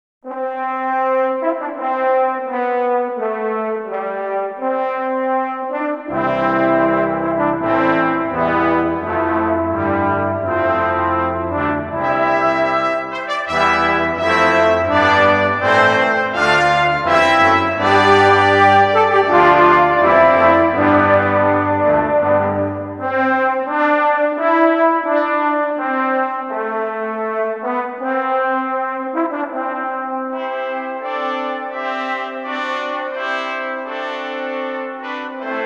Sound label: Fanfare on Old Hundredth